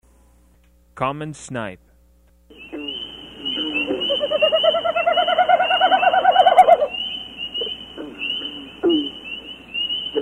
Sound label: Common Snip